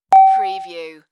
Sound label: Beep